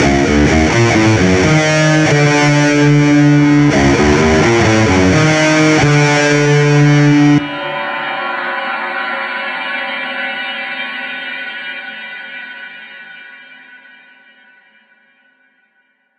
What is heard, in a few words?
Rock Guitar; Recording One Rock Guitar; Zystrix; I used a weird free Surge synth patch called Aliens and the Supermassive Reverb by Valhalla set to Boards of AFX to fit the mood